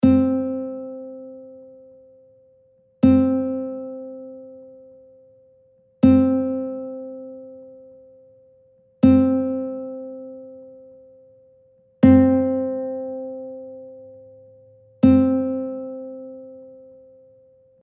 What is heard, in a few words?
Sanshin F strij C4